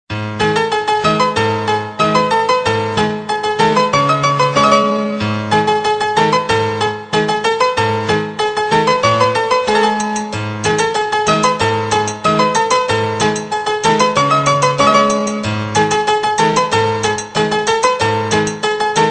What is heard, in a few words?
arabic piano ringtone; android